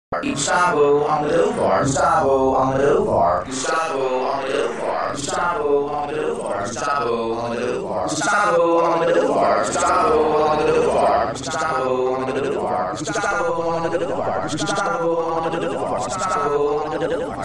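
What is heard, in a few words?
Is Calling R&B, ringtones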